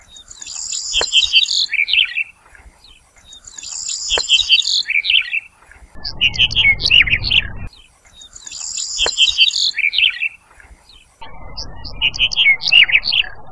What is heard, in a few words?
emberiza pala